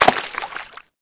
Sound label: marsh land; Golden Crayon Sounds